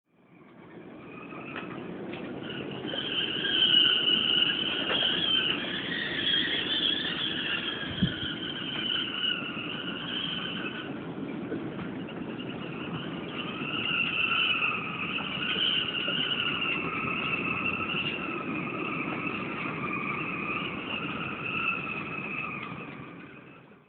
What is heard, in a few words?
wind; loctudy